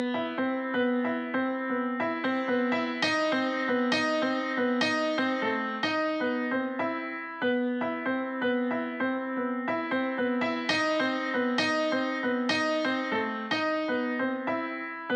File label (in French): piano qui tourne